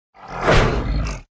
bugzard attack

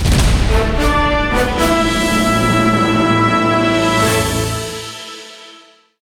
war win

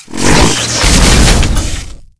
mech ape lord attack, monster

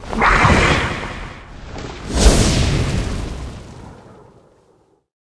blade peryton dead, monster

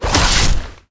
crab atk, monster

